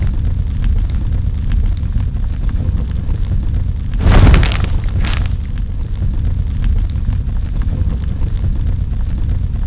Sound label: Fire Titan stand